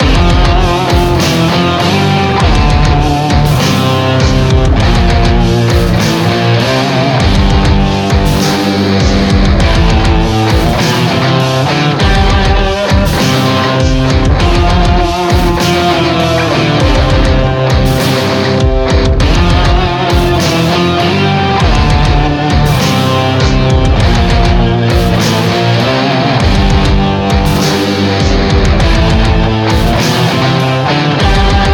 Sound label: macho pomp metal; WrestleQuest